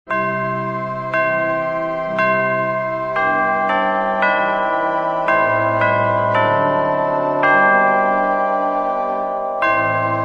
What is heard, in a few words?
Cathedral Organ And Chimes